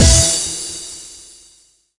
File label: Music Dance End; game rip; littlest pet shop garden jungle winter; nds